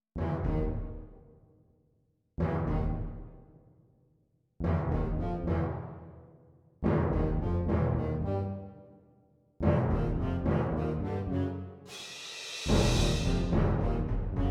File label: Latin Suite Scary Music